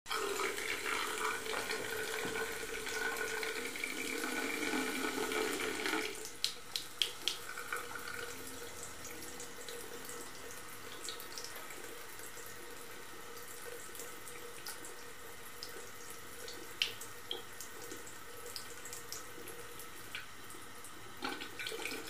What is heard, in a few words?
Water flows into a porcelain sink